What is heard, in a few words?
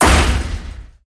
oven break